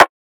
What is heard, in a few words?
drum rim low blow; Virtual Riot; Drum Hits